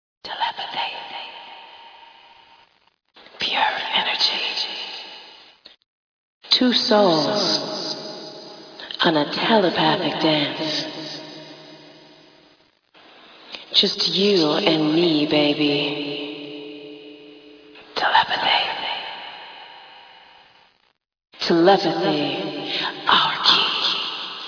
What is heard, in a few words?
acapella